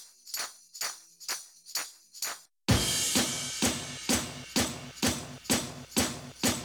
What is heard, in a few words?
Percussion